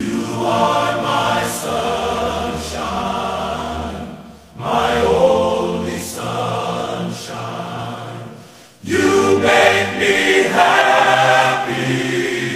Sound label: choir